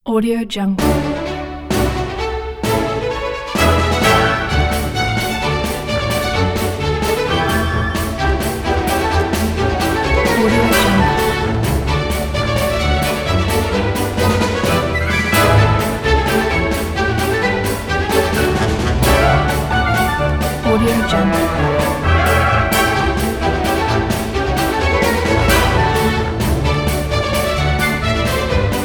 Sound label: classic polka